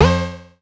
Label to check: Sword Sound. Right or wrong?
Wrong